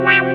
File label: WAWA GTR; E-MU Formula 4000 Series Vol. 5; Protozoa; Phatt Instrmt